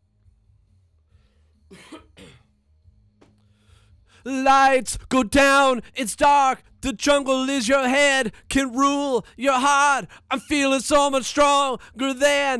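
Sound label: vocal; Pro Tools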